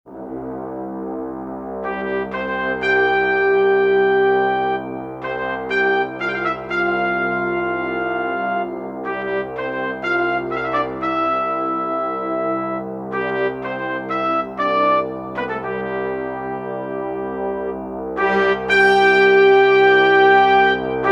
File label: brass; cms weihnachtskalender 2024 freeware